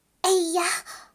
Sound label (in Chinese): 受击; BattleCry; 莉莉